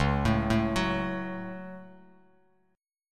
C sharp Suspended 4th Sharp 5th C sharp down Bass Standard; arpeggiated; JGuitar